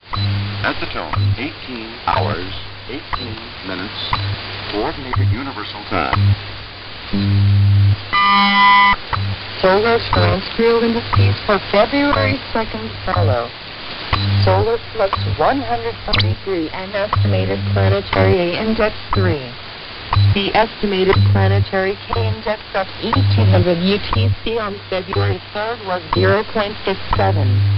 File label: WWV Timesignal